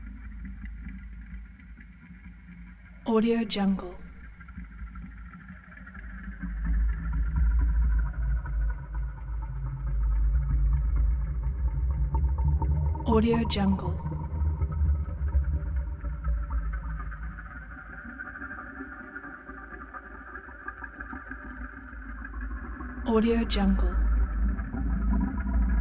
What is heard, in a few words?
vibrato ambience; prosoundfx